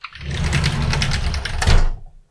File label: open dish drawer